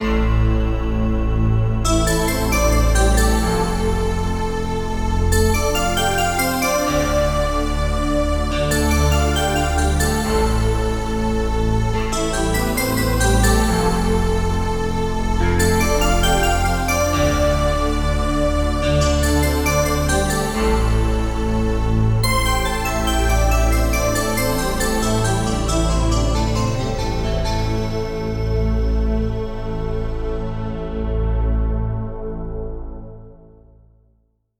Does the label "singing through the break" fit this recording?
No